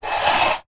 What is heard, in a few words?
mug cup ceramic slide on kitchen worktop; household